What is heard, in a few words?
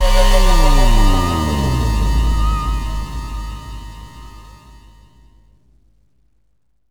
Sting; Stronghold